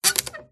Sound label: Stress Creak Squeak Groan; PMSFX